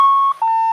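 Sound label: Low Battery Shutdown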